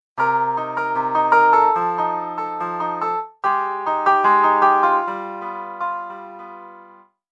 Band Piano

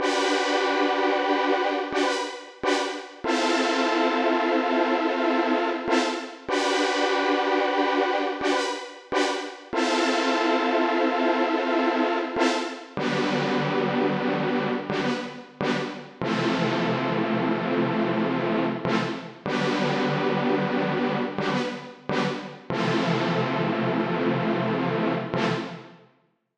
CS80

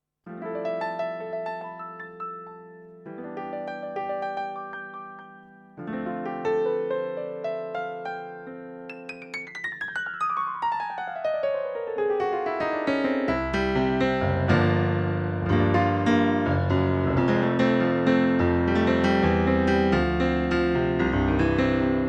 Ac Grand

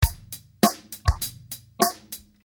morph drum

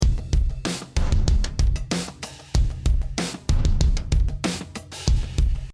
Chemical Beats 95

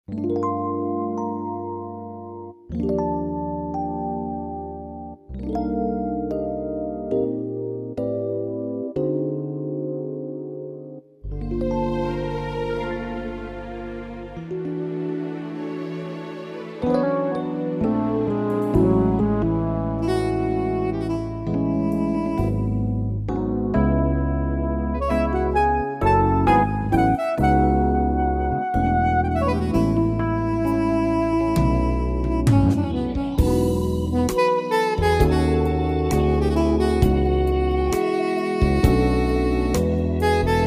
Tyros Suitcase EP Swt Soprano Sax Swt Harmonica

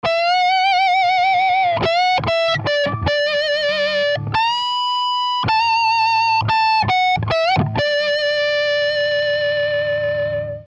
MXR Lead

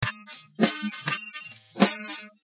delay drum

